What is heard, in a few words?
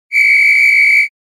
whistletone